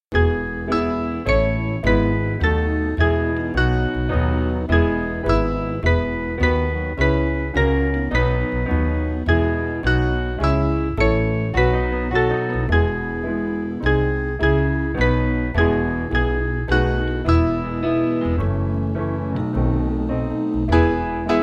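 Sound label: Piano, Music Backing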